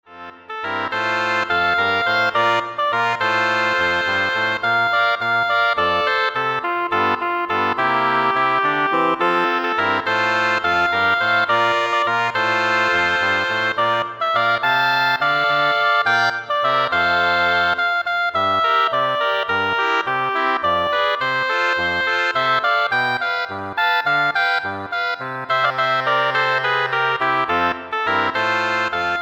Double Reed Ensemble

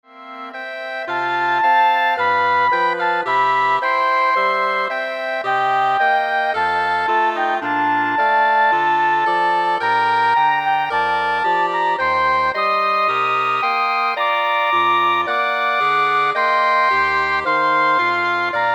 Woodwind Ensemble

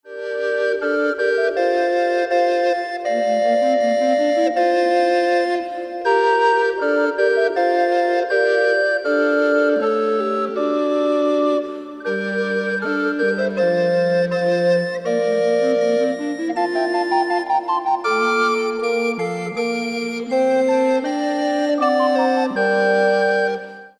Recorder Ensemble

